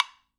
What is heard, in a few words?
WOOD BLK P, PERCUSSION; Sonic Reality; Interactive Drum Kits